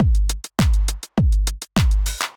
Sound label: Drums; simple session